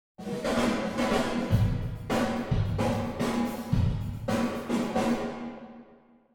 overlap drum

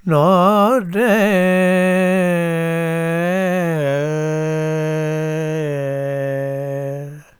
shafqat derbari phrase

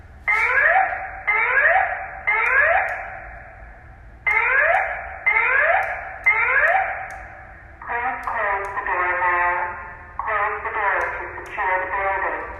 door alarm

